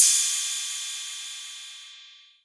Cymbal, Novation Drumstation

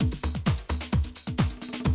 130, Classic DrumLoops

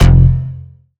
Bass F, Bass Synth

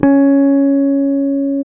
Basses, Roland

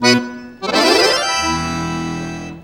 ACCORD HITS; Best Service; World Colours

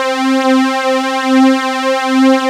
SAWTEETH; Q Up Arts; Dream Experience

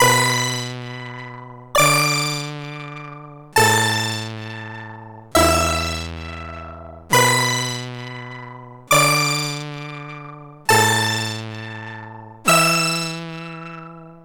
C64 Chord, 8-Bit chiptune

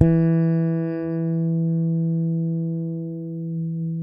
FRET E, PEDULLA BASS; Masterbits CLIMAX 7 - Bass

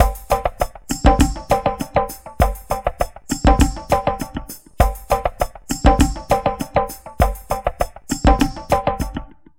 Wet Tabla Beat; Sonic Foundry (Sony Creative Software); Myths of Technology; Composite Beats

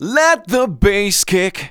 vox; Ueberschall; Techno Trance Essentials

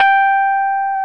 PIA, E-PIANOS MKS-20; Sound & Vision; Gigapack I CD 2

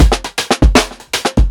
PICCOLO 80 SHUFFLE, drums; Basement drums vol 2

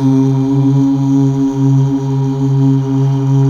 Mal Uuh; Best Service Gigapack 1

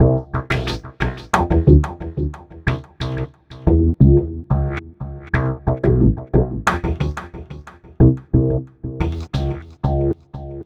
Remixed Acoustic Bass; Sonic Foundry (Sony Creative Software); Leo Cavallo Bass Taster

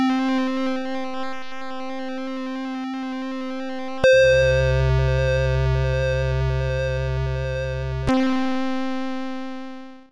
synth, amiga med

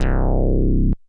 ANALOG, BASS; Best Service; Hyper Dance

